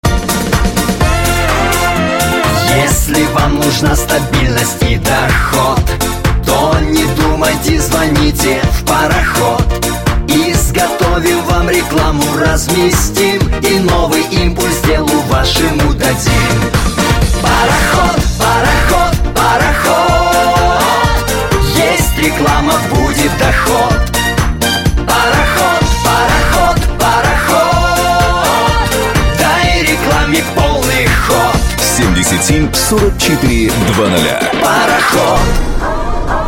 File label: song